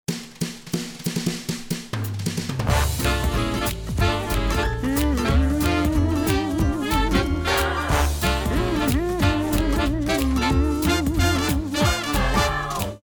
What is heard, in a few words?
start action